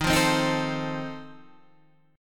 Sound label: F 7th D sharp